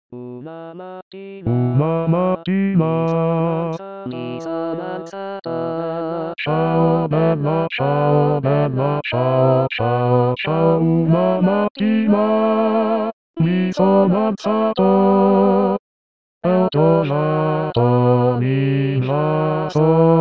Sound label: bella ciao basses